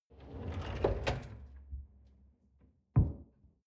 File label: folding door close short